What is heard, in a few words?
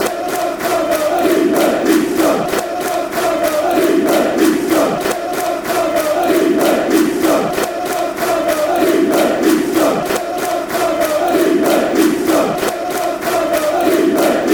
barmy army, fan chants; kevin bonds